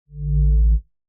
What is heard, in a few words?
Magnet; GGJ2022; Magnets